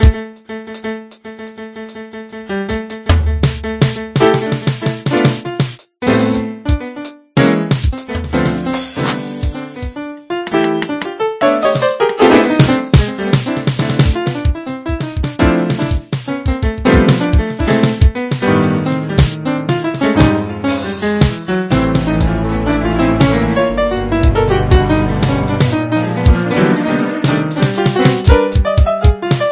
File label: midi music